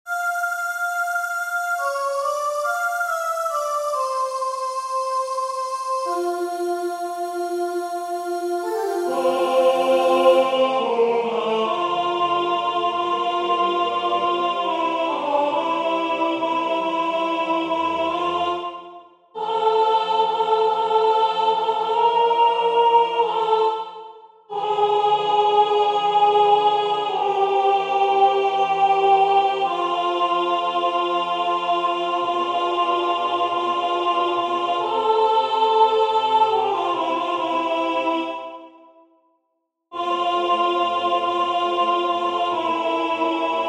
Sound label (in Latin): Laudate dominum Alt; W.A.Mozart